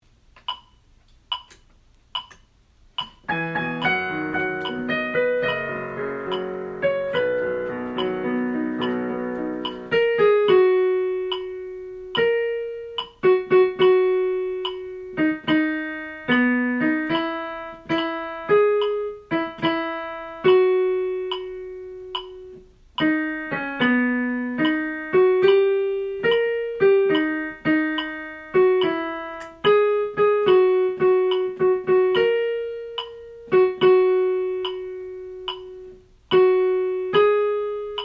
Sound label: Alto